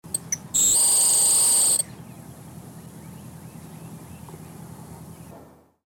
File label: trilly brown bird